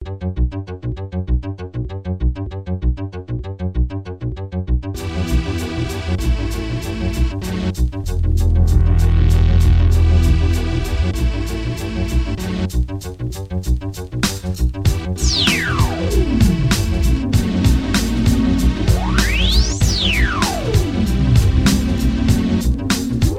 beat

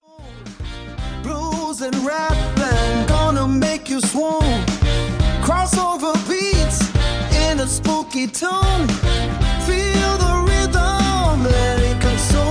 Jazz Night Spooktacular; Ai Generated Halloween Jazz Nights

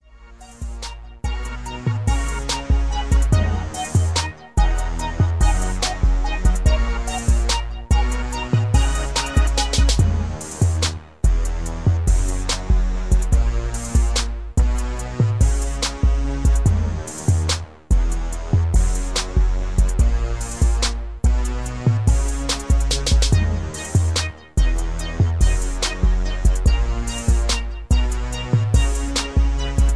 72BPM

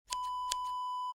scissors snip; Scissors Snip Wav Sound Effect

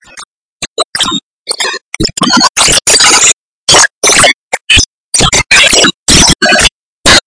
Jazz Night Spooktacular; Ai Generated

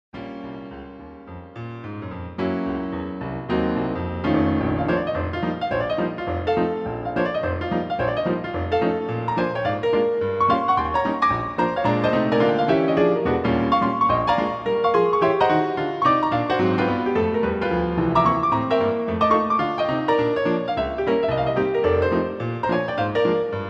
Stomp

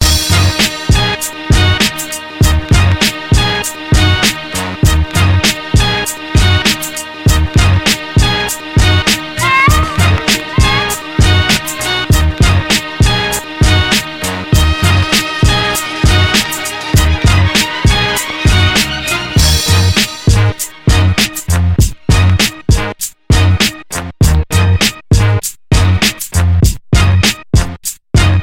beat

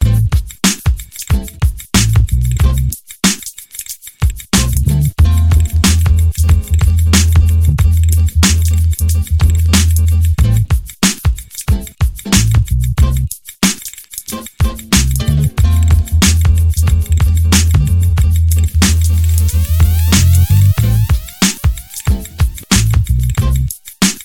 beat

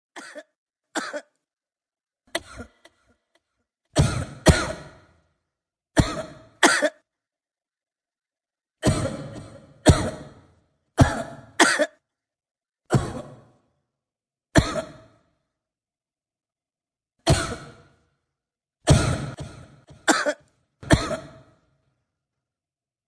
Smokers Cough Ringtone

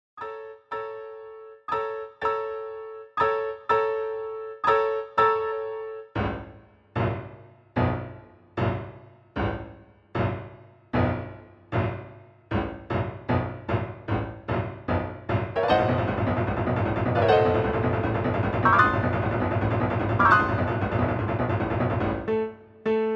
Blues

